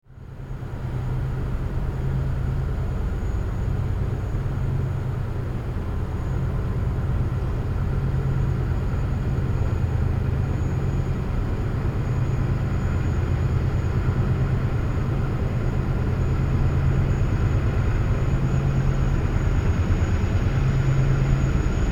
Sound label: Coal train arriving Otira night time